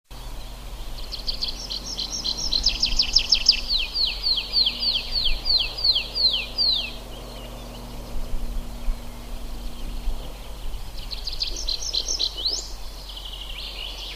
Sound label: Anthus trivialis